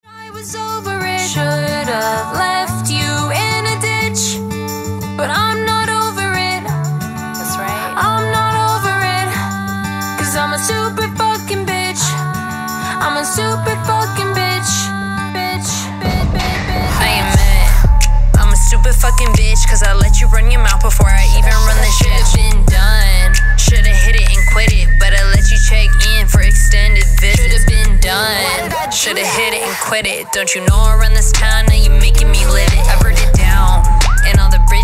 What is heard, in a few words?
POP HIP HOP